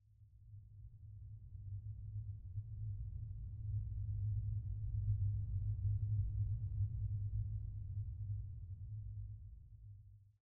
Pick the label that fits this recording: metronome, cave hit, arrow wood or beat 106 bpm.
cave hit